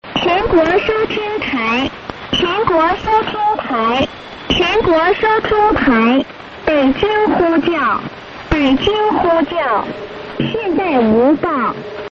marker

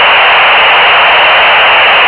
signal

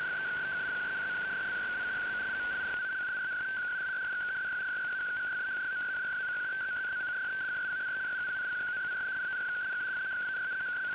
1245 khz fsk 2; signal greceskoj

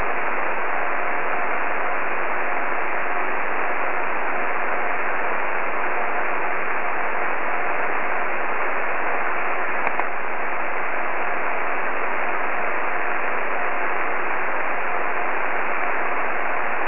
cw; sm6bhz

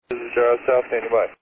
stab

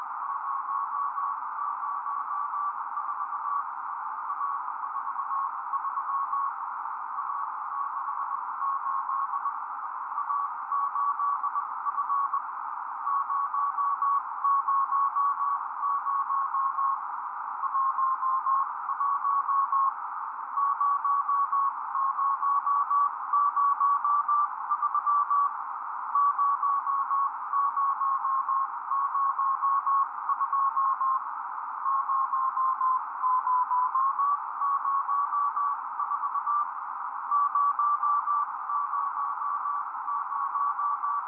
cw beacon 437485mhz; co-66

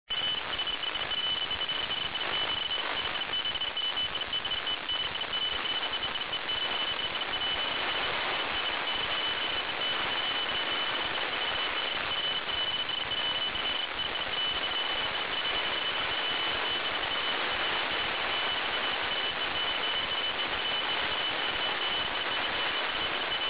149 MHz

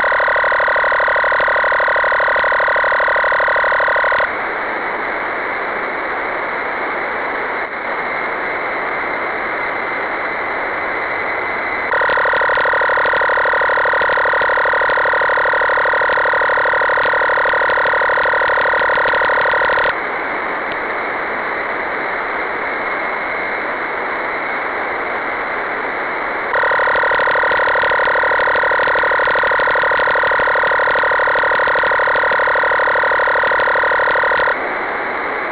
mfsk-4

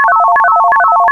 ani-signals

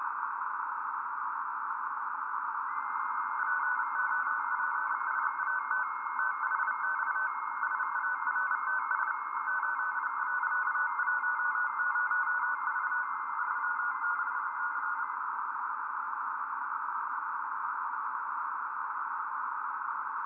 popsat CW

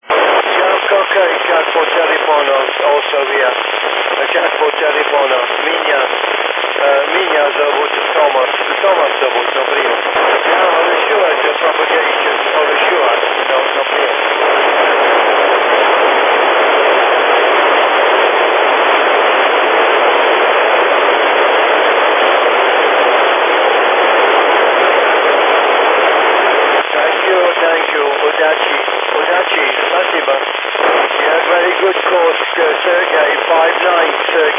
27.150 MHz nfm

